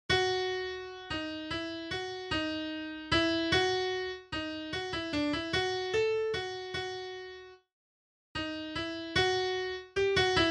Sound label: melody